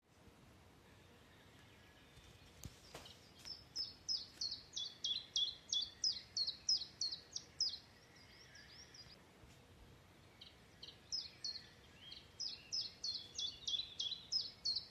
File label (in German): Zilpzalp